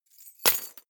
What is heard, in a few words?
key ring large drop parquet hard